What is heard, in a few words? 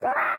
parrot idle; minecraft